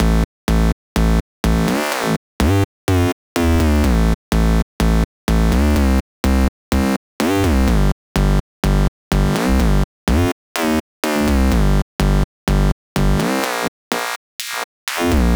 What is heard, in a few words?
Bass, Afterhours EDM, Electro Shock; Sonic Foundry (Sony Creative Software)